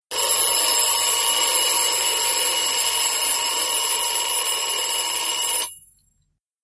CLOCKS ALARM CLOCK: INT: Two bell alarm clock rings and stops.